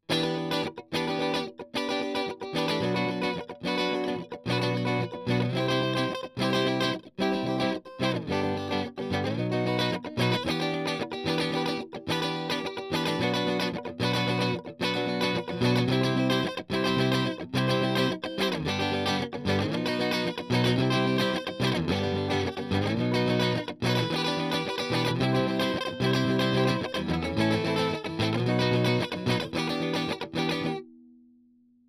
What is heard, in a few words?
Strum